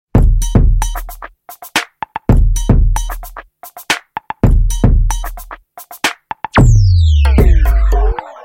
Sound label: Bum Bam